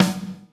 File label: LUDW SN, ACOUSTIC KIT; Greytsounds VOL-1